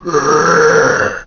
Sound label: Growl; cstrike; zombie plague